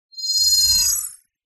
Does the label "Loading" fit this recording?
Yes